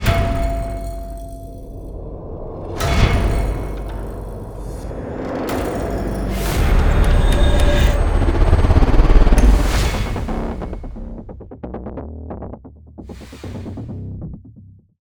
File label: SFX; Fatal Attraction Generic